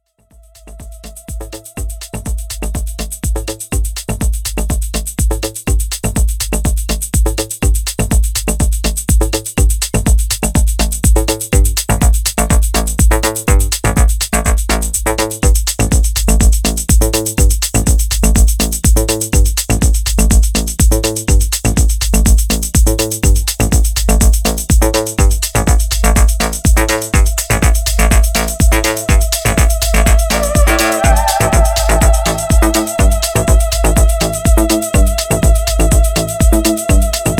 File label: lead